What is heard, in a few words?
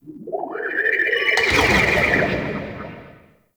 Ship Gen Start Stop; Warframe; Corpus Ship Remaster